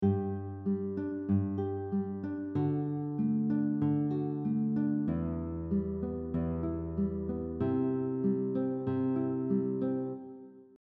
Fingerpicking pattern 9 alternating bass pattern Travis picking, guitar